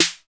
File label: SD 808